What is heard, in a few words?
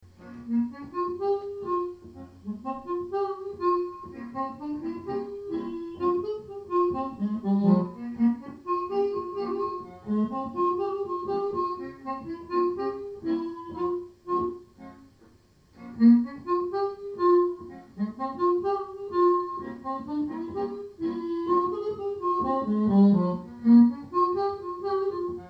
Two Guitars